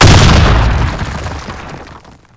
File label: hegrenade; weapons; cstrike; csgo